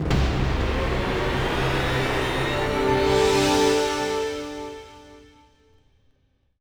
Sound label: Level Up; Dungeon Hunter; android game music